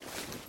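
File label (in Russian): шустрый быстрый короткий звук работающей швейной машинки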